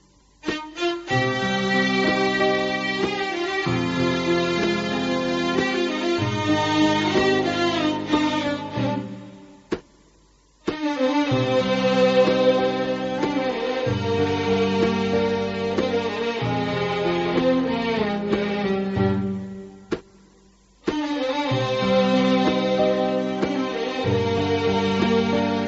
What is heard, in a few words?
Iraqi Music